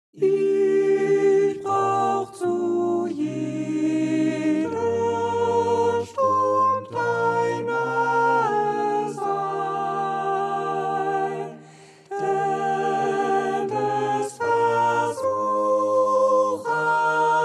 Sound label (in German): Bleib bei mir herr Sopran